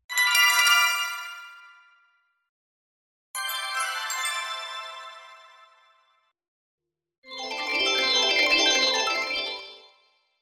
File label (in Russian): konets korotkoy muzyikalnoy emblemyi zvuka volshebnoy flesh animatsii multfilma